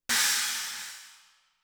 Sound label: STACK CRASH